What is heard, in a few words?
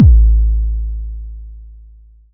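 909 BD long original, kick drum; Goldbaby Tape808 & Tape909; The Tape 909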